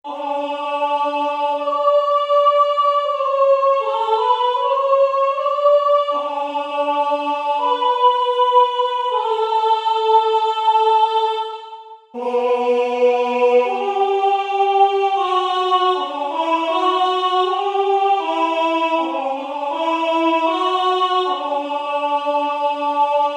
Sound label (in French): Voix Haute